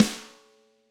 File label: Snare Hard; Drums; apulSoft apTrigga2; Steven Slate Drums